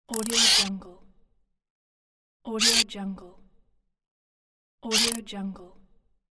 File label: variable sniper scope zoom